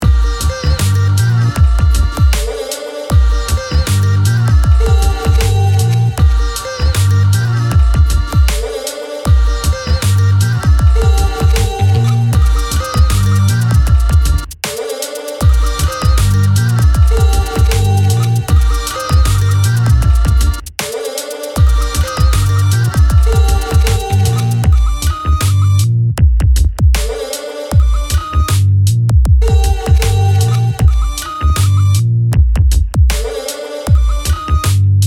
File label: kaval